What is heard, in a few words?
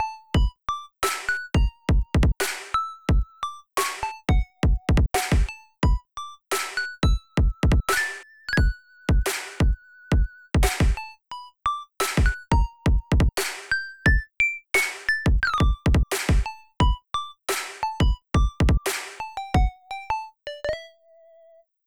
Mixdown Kick Snare; Future Bass; 分かりやすいようにさっきのプラックの方と一緒に鳴らしています。; KawaiiFutureBass; ハーフテンポハーフタイム; Future Bass Starter Pack